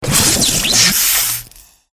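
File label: moray eel; murena; buff 3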